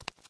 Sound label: hard barefoot, Footsteps